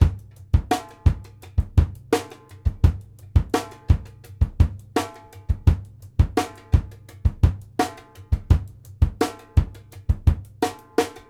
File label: FUNKY KICK, 84; Sampleheads; New York City Drumworks VOL-1; SP BLASTX 84